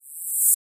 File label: Interface Screen, Sci-Fi Space; Interface Screen 1